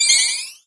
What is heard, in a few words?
Spin Magic; Zelda's spin attack (magic sound)